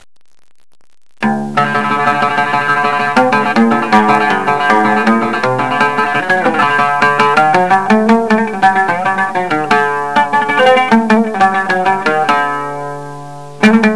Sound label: instrument oud